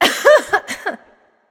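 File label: clear throat, female; smite; voicepack; hera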